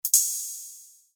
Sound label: UI Quirky